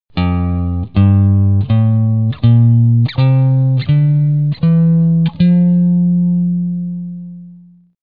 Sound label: F major, major scale